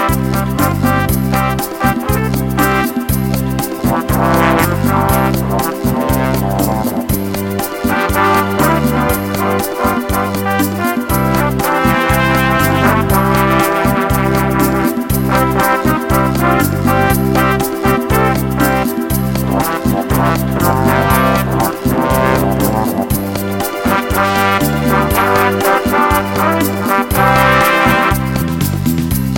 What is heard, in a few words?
Smooth and Pretty